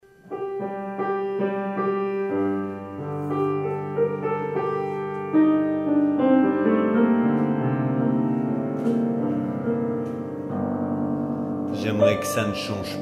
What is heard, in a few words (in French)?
piano voix; capsule lem; nagra; ares-m